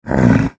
gazer die; edgar; enemy

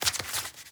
shuffle deck

